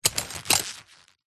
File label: foley cowboy leather belt holster short grab handle movement; zapsplat